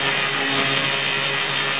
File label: beam; battalion